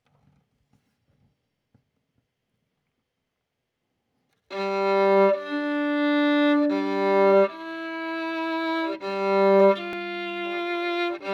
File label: Violin